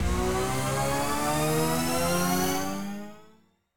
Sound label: respin Snd, slots; king of slots